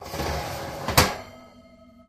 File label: Oven Rack Slide In